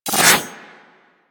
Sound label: Melee Whoosh; Warframe; Corpus; Arachnoid Hunger; Abilities